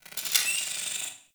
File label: Side Pillar Rand, Small Cargo Room; Warframe; Ambience